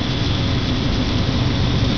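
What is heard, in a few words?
hover move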